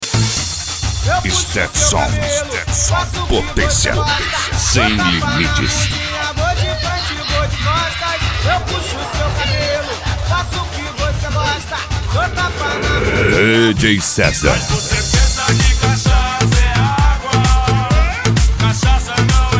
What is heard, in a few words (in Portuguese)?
sound car carnaval